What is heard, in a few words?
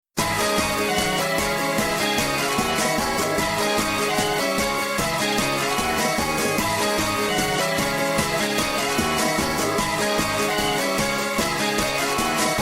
instrumental snippets